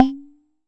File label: Jomox MBrane, drum machines; Jomox MBrane 11